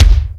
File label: KIK, SDX KICKS; Greytsounds VOL-1